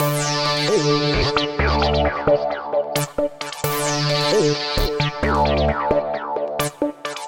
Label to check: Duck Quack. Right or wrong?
Wrong